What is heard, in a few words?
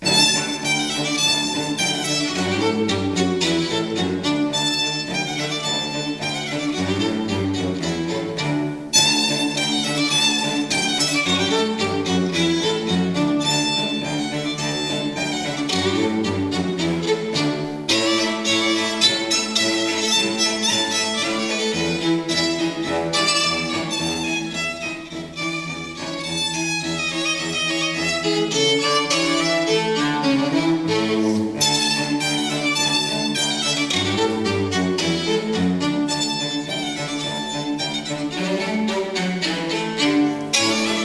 jsbach duet musette